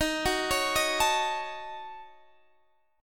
D sharp Minor 7th Flat 5th D sharp, down, Guitar, Drop D